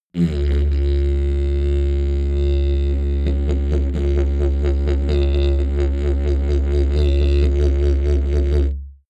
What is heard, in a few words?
sequence didgeridoo wobble sequence